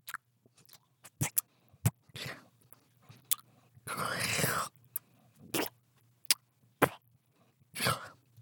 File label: Pig Creature